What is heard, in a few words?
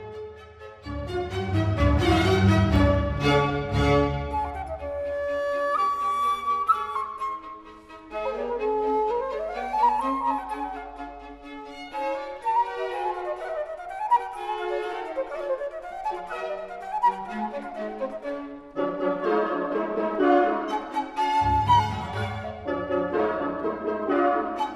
flute concerto in D major rondo allegretto; K 314